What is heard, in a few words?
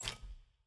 icon locked button click; battlepass